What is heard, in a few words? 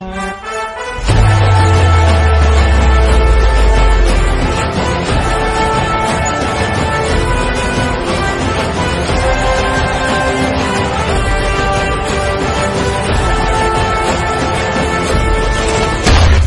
Bgm Ringtone